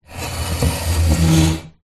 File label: household chair dining slide tiled floor